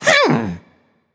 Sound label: grunt attack, voicepack; smite; jorm